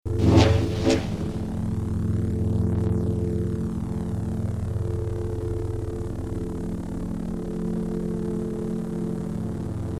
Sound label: Double Bladed Saber Sound; FXHome